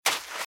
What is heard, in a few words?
Footsteps Sand Dry Run Left; RadwinIsland